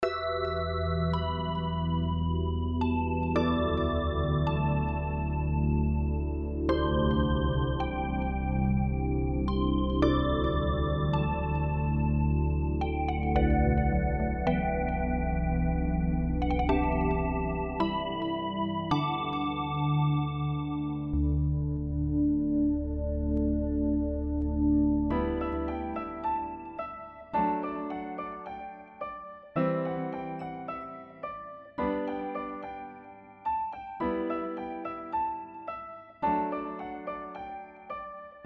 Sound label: Rain